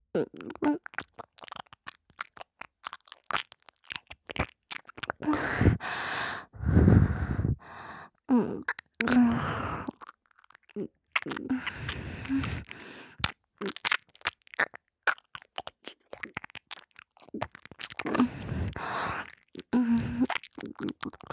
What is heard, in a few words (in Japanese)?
じゅぶぶぶぶぅ…, 左耳, ASMR